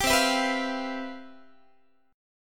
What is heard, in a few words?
C Major 11th, Guitar, chord; CM11 strummed